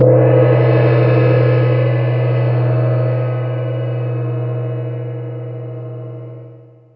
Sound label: gong